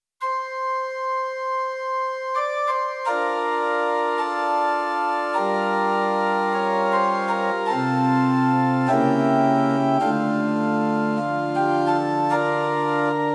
Liturgical Organ Books, Pitch 440 Temperament Equal